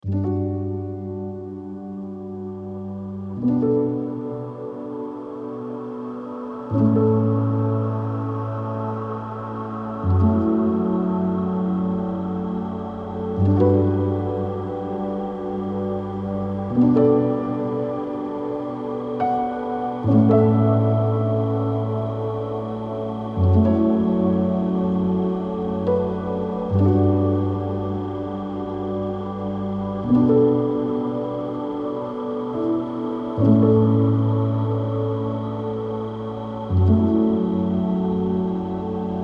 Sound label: ambient piano relaxing music; crystal lake